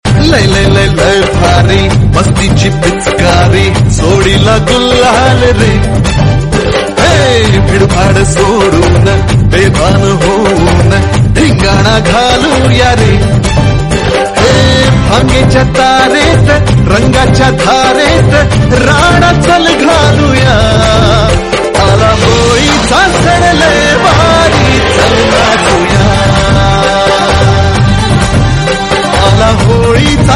Latin message; Comedy

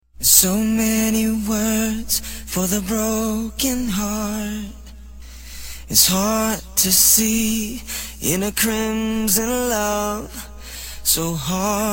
Acapella

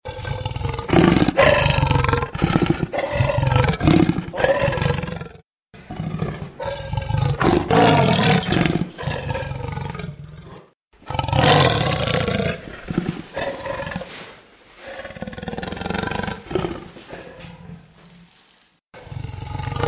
leopard growls; Animals

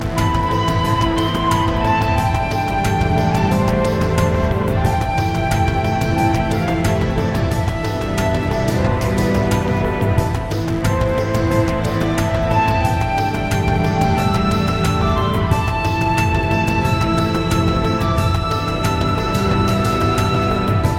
low step; Final Fantasy; Archylte

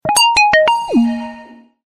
modern tone

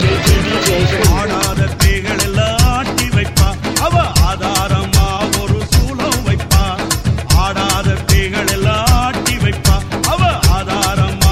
tamil devotional; Indian

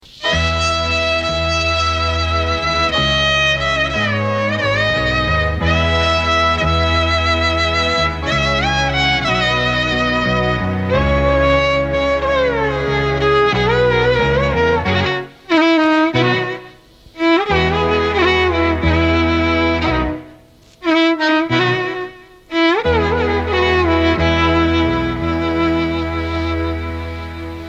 Thalapathi Violin; Indian

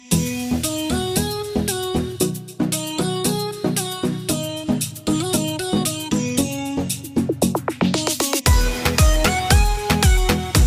instrumental remix ringtone; Pop

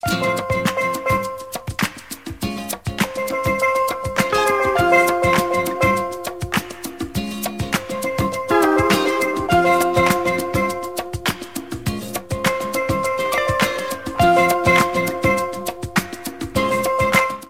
jazz ring

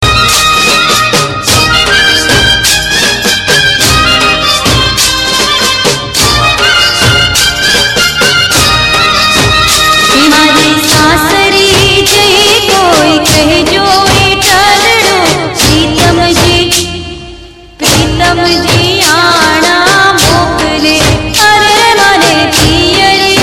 gujarati garba; Indian

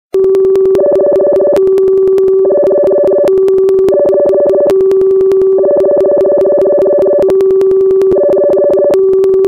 extreme wake up

